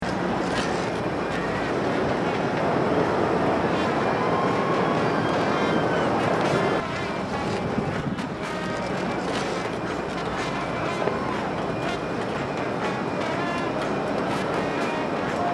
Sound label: balloon